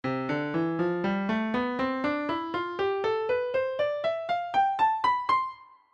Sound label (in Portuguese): Escala Ascendente